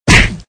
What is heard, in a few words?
Hit; mega boulder rampage gamerip